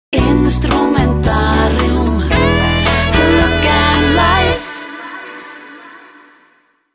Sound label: instru